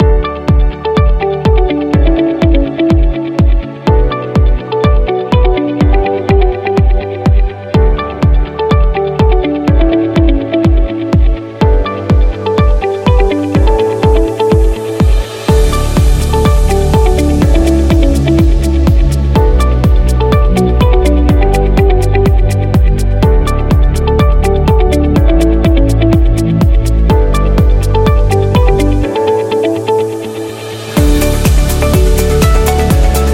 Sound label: Happy Corporate; corporate promo